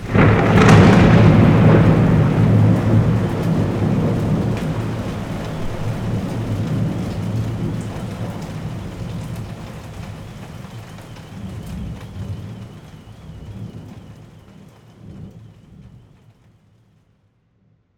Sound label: Thunders Combined; SFX; IRL Recorded; Rain - Thunder